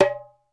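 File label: darbuka T, ethnic percussion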